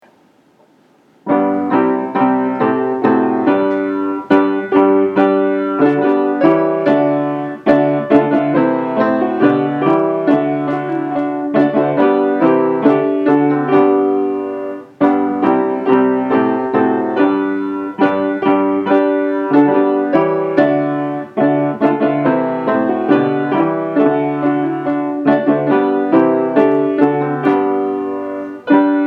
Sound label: Now is the Month of Maying all voices on piano only